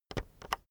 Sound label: keyboard external enter press slow soft